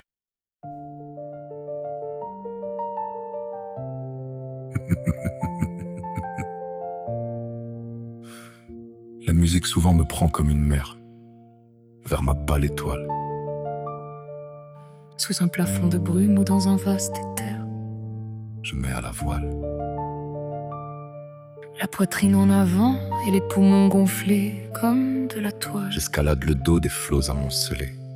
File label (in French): La musique fusion des voix